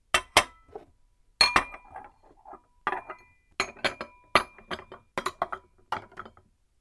clearing table; cooking

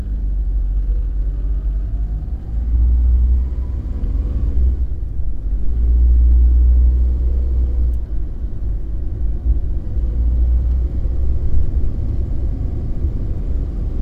driving, car

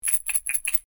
shake pill

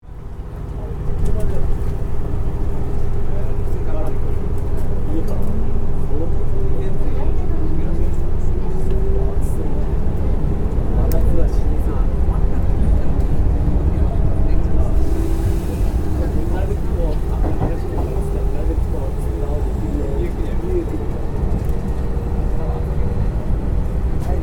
on a train

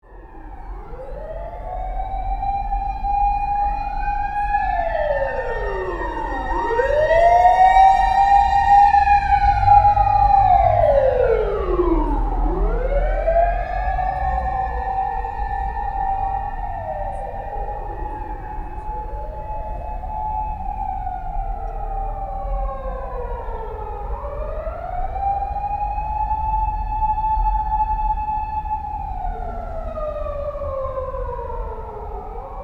fire engine passing

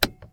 dome lamp, car

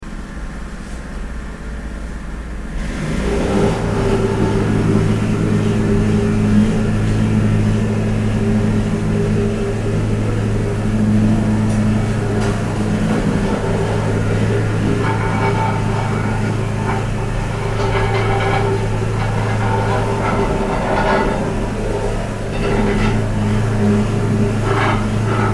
streets construction

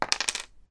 dropping a shogi piece

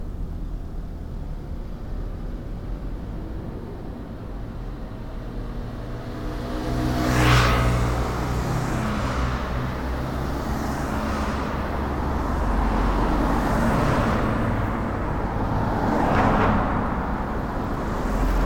town road

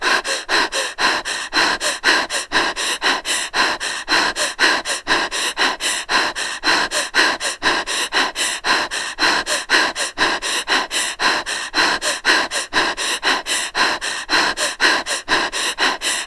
women hyperpnea; horror

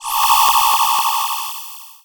8bit magic

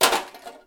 throw away can

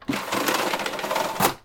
accordion screen

